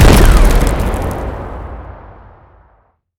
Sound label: explosion